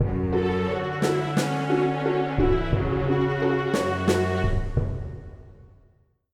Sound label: Mission Fail Stinger; Sol Exodus